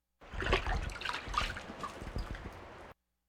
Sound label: Animal effects snorts grunts farm ambience